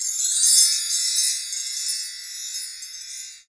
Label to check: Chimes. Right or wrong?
Right